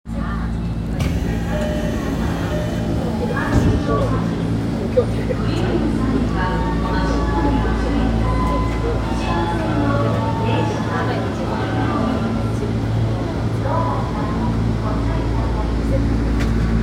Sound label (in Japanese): 丸ノ内線国会議事堂前西